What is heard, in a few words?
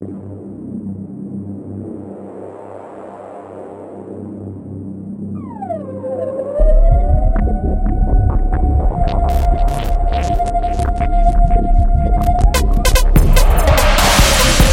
reese bass